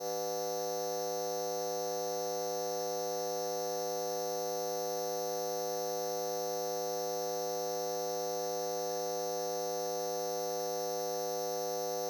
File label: Lamps Designed; becquerel